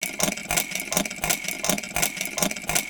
rotator motor, Electric Motors; 3maze

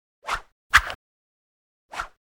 Whoosh; NoizBoy; Hong Kong Action Kit - Hit Kit #2

